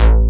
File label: FM81Z